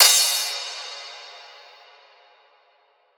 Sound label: cymbal modded; Drum One Shots; SD MIDNIGHT Sample Pack; Slate; Cymbals